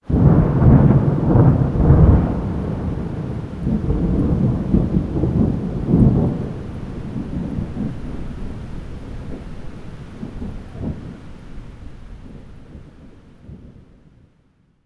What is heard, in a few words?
big thunder with rain; mixkit; cozy